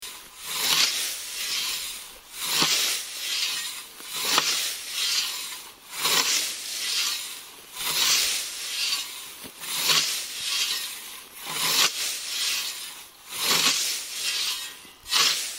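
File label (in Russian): kosa, scythe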